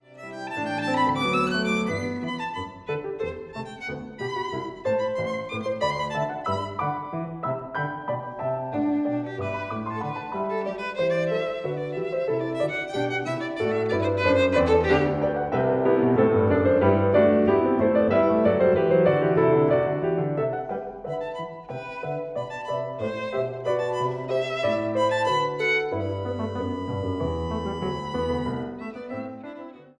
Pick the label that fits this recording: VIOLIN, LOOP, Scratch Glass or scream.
VIOLIN